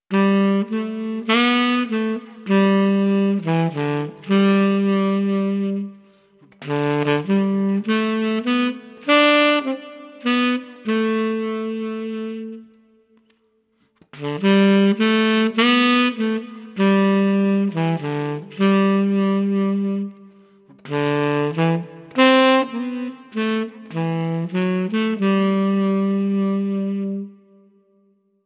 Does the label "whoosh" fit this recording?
No